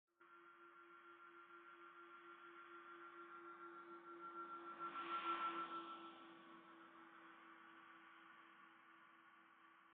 indoor Two Tone, Indoor Two-Tone AVAS, electric vehicle